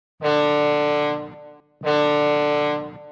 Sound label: foghorn